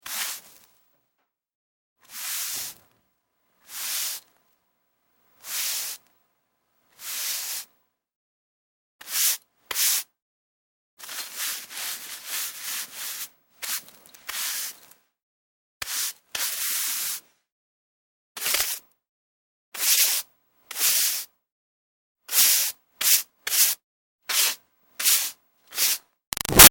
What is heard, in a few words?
Foley Brush Dirt Sweep Archaeology; Yard Tools; Big Room Sound